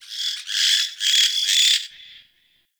Bird Alerted Caique Call; Midrath Island; Wildlife

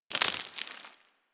Bullet Impact Debris Dirt; Warframe; Weapons

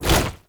Tank Infested Arm Move Fast; Warframe; Lasrian

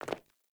Sandals Land Default, Footsteps; Characters; Player

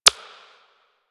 Button Press; Warframe

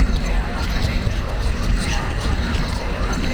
Execute Aim Loop; Warframe; Warframes; Pax Duviricus

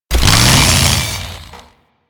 Saw Attack Rev Heavy, Melee Weapons; Warframe; Grn Ghoul Saw Player

